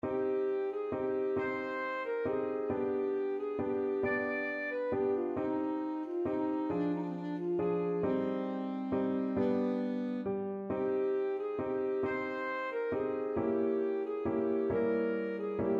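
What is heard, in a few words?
alto saxophone; farrenc op5 no2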